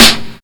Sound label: SNR